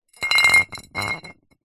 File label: glass bottle 1 ltr set down on side wobble tiled floor